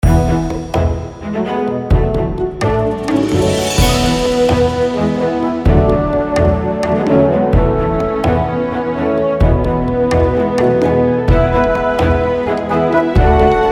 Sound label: Bgm City; Kuwhorante